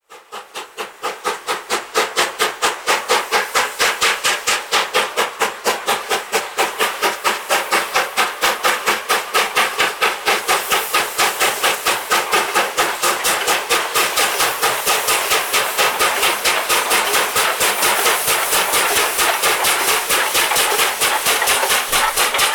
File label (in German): dampflok sound china